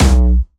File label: B1, Jumpstyle Kick; Bassdrums; Jumpstyle Multis; vengeance essential club sounds-3; VEC3 Bassdrums